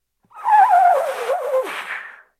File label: inhale